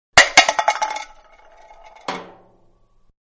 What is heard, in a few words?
Small Aluminum Can Fall On Concrete; Metal; Materials; Can Small, Aluminum-Falling Onto Concrete; Manhattan Production Music